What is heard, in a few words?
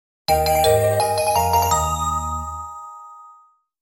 Obtained a Key Item!; Pokemon XY